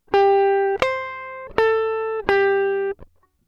gtr trem

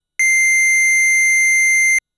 Square C6, keys; fleet keys Samples

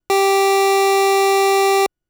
Pulse A G3, keys; fleet keys Samples

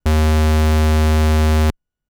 Square D#1, keys; fleet keys Samples

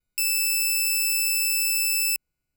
Pulse B E6, keys; fleet keys Samples

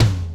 Tom

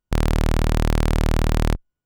Ramp D#0, keys; fleet keys Samples

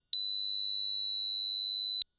Pulse A B7, keys; fleet keys Samples

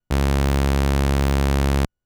Ramp C#1, keys; fleet keys Samples

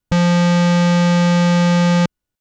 Square F2; fleet keys Samples

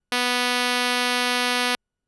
Pulse B B3; fleet keys Samples

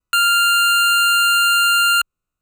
Pulse F5; PulseA; fleet keys Samples

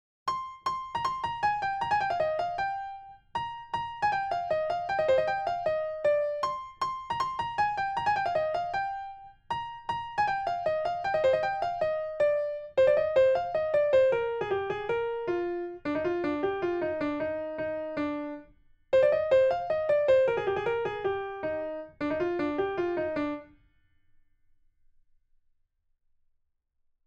Lead Piano

